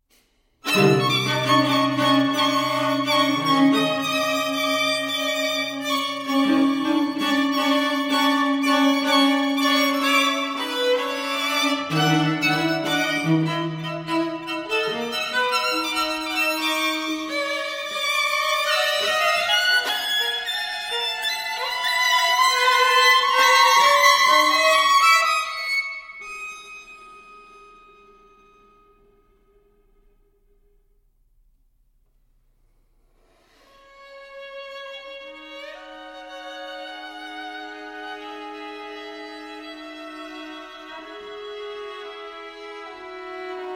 string quartet; Armenian Music Project; Armenian music new and rediscovered

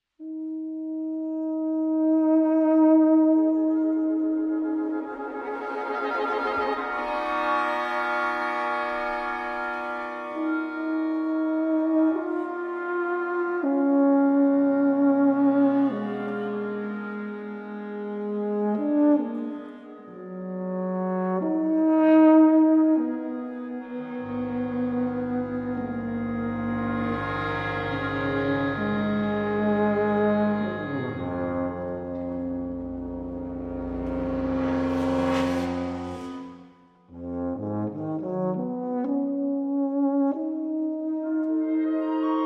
tuba concerto

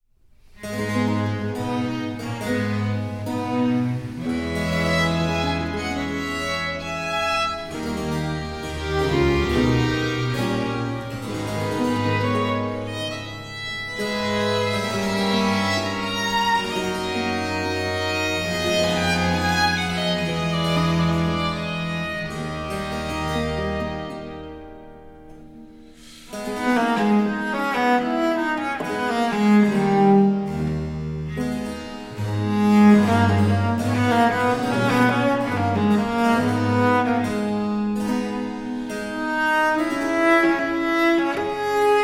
concerto for cello in d minor adagio

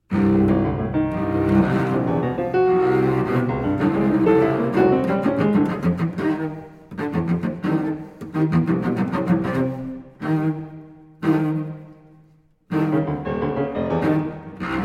sonata for cello and piano, caccia

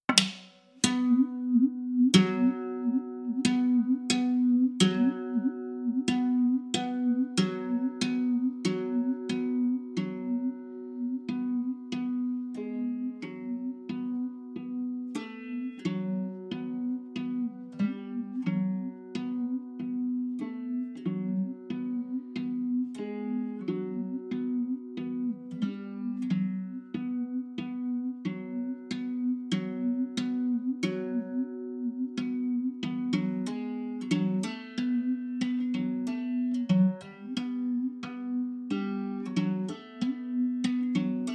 berimbau solo; berimbau solo no 4

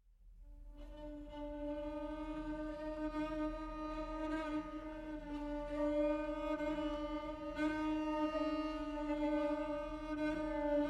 piano quartet

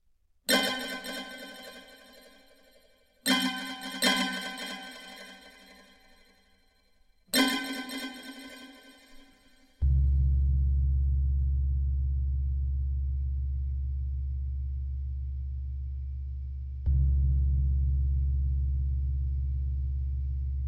pieces for guitar with live electronics

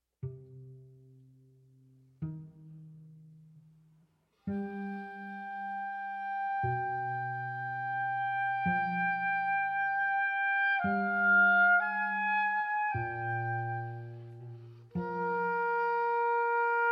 oboe quartet lament; hommage a j s bach

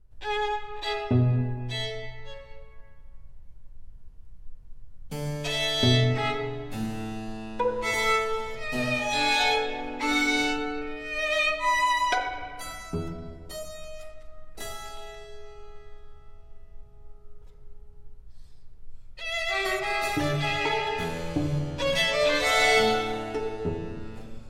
piece for mixed quartet baroque assemblage

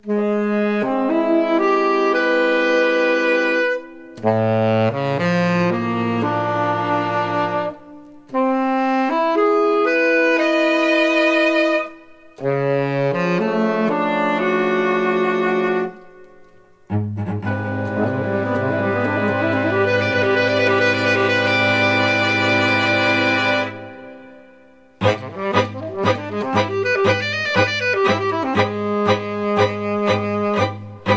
concerto for tenor saxophone and nine instruments

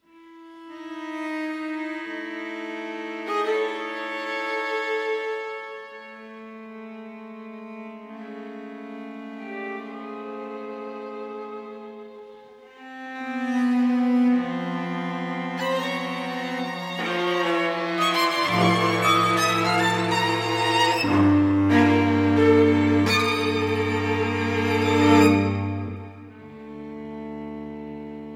string quartet; I. cognitive study of the generalized anxiety disorder

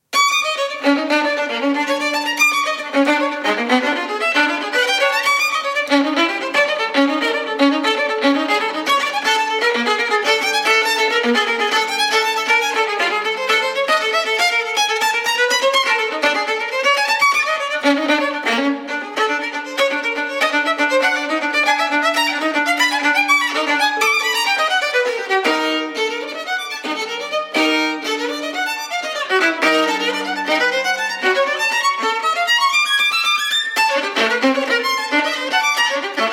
partita for solo violin iii esercizio

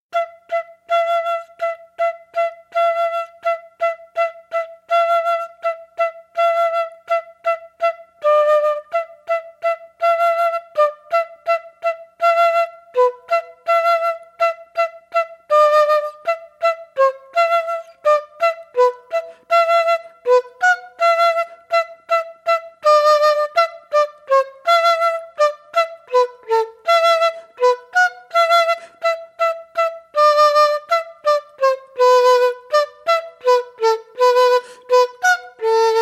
pluck bow blow blow

